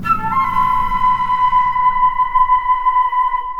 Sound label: flute hard, Jazzmatazz Loops